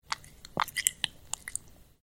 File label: lick; Felinid